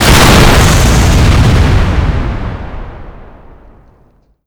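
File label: explosion car distant; Explosions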